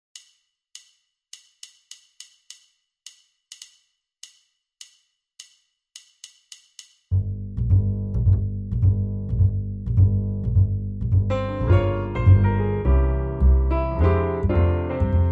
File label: minus drums